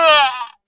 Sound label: enemy death